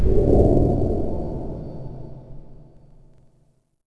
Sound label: Space Junk Bump; Sid Meier's Civilization 4 Complete; Beyond the Sword